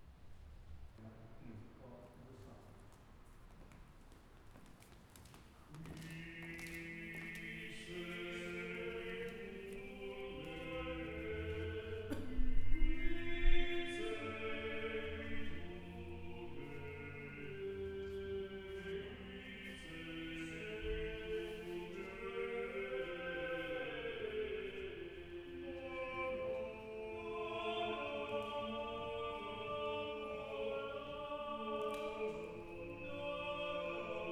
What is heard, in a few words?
wedding music, chant